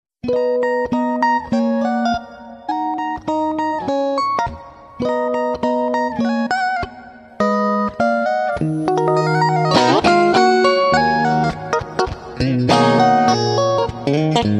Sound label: Guitar sounds